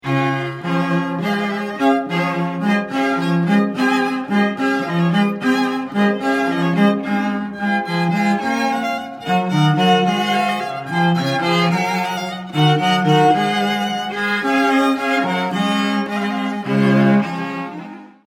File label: Water Music, Strings; Handel